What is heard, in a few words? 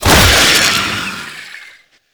Undead Owl Attack; Warframe; Dante